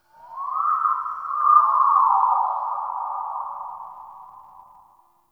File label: Signature Call; Ambience; Corrupted Ode